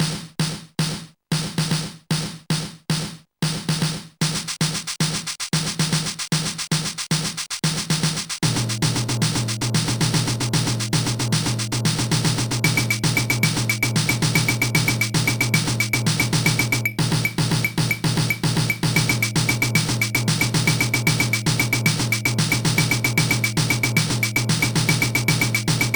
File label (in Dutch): drumpartij; Sonix Music Driver